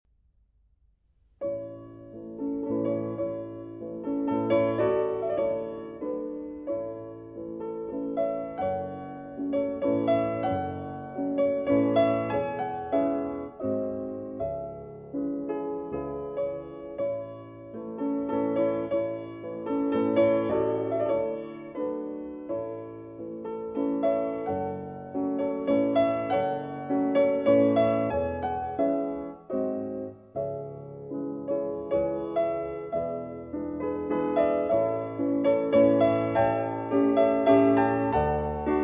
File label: Piano Duet; 16 Waltzes, Op.39; In A Major